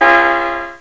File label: horn